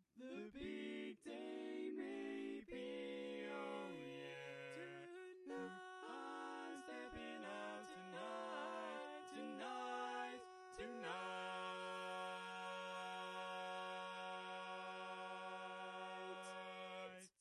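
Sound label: Baritone, barbershop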